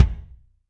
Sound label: DM5 Kick, Alesis DM5 drum machine